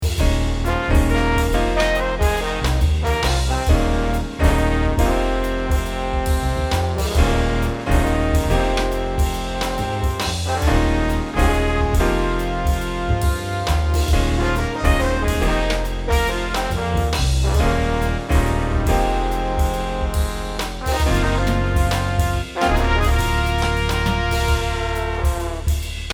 Jazz

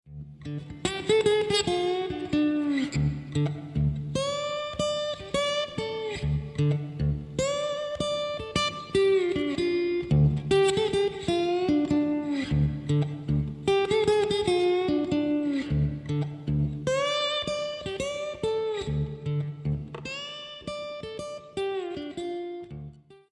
swamp guitar

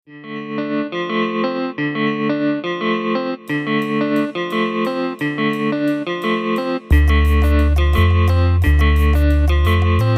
Western Techno; Lindemark Music Collection

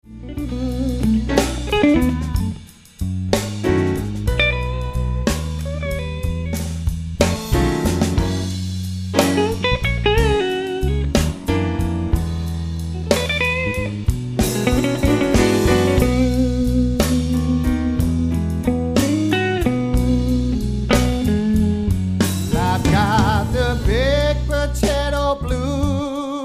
Blues

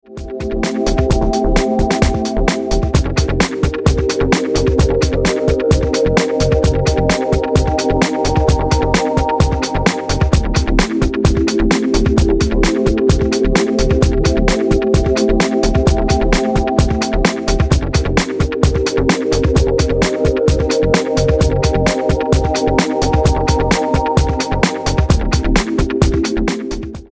Bling Bong

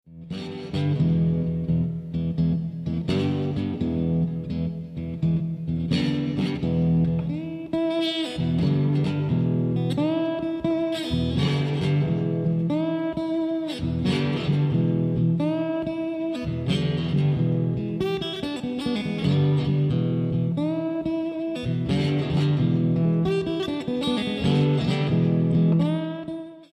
Blues guitar

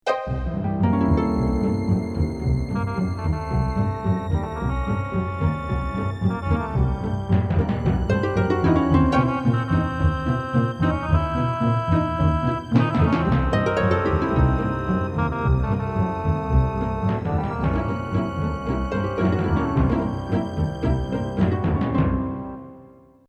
Orchestral Flux

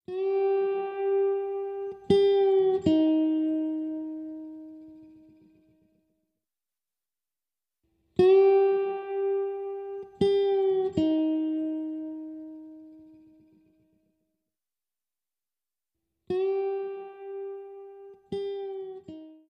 swamp guitar

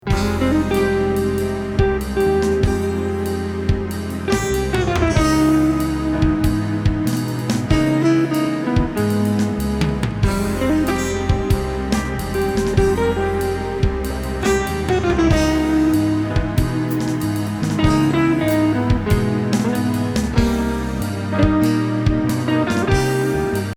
Jazz Ballade

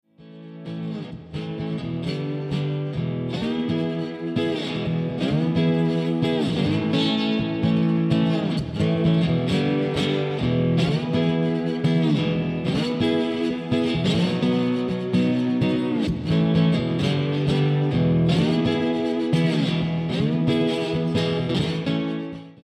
Blues guitar

